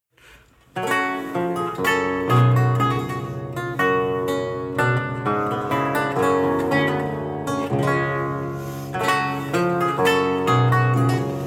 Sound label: Balleto